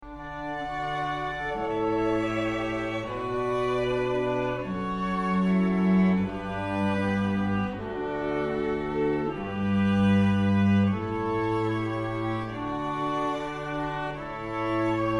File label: Canon in D, Strings